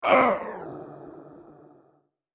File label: Hurt